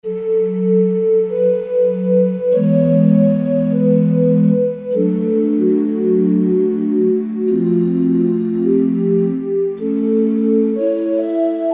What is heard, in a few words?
Bottles; Korg MSC-10S Ethnic